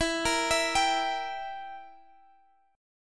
E Diminished, down Guitar Drop D

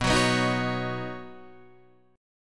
B Major Add 9th, B, down, Guitar Standard; strummed; JGuitar

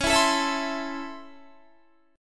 C sharp Minor Major 7th Sharp 5th C sharp Guitar; C#mM7#5; strummed; 21,19,19,21,21,19

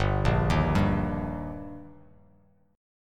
G Minor Sharp 5th, chord; arpeggiated; 3,1,1,0; JGuitar

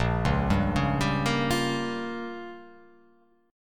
A sharp Minor 6th A sharp down, chord

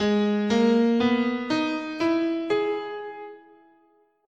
Ab Hirajoshi

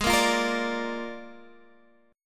A sharp 7th G sharp

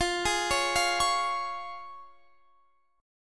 C sharp Major E sharp; C#/E#

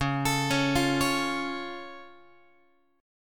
C sharp Augmented down Guitar, chord; x,9,12,11,9,12; arpeggiated; JGuitar

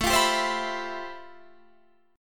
G 13th A, chord; G13/A strummed

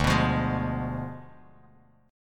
D Diminished 7th D down Bass Standard; strummed

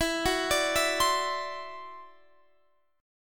E 7th Suspended 2nd Sharp 5th, E, down

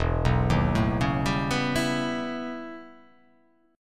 E Minor Major 13th E; EmM13 arpeggiated; 0,2,1,2,1,2,1,1

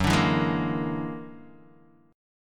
F sharp Augmented 9th, Guitar, DADGAD; 4,5,2,1,1,x

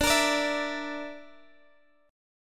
A sharp Major Flat 5th

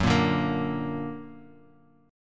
F sharp Augmented F sharp; F#+ strummed; x,x,4,3,2,5,x,x

